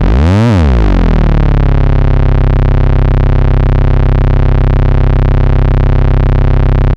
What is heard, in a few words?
MEMORYMOOG